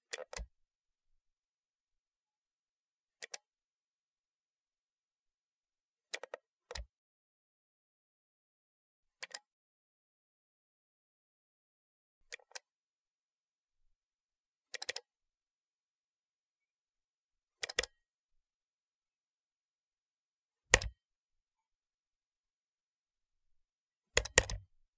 Computer mouse click

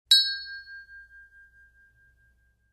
Hitting the glass; tableware

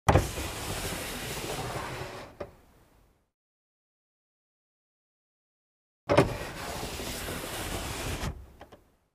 Car glass lowered, raised manually

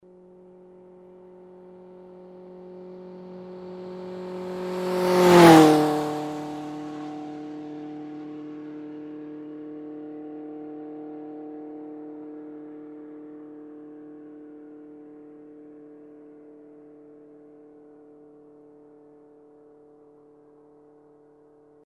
Motorcycle passes by quickly

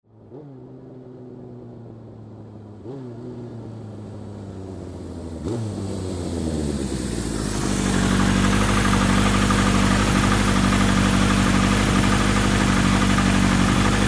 Motorcycle approaching, turning off

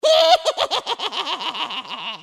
Witch laughs evilly

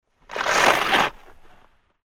Skiing, travel and stop, snow

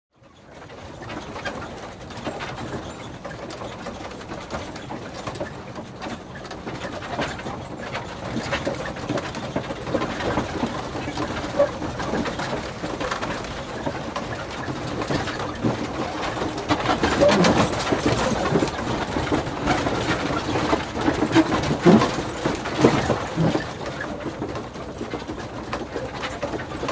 Horse cart movement

